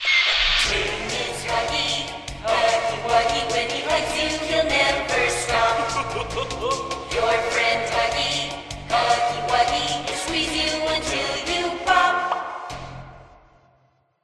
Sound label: reverb jingle; huggie